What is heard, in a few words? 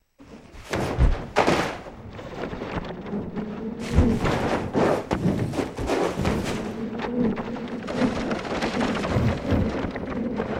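Books Fly Through Air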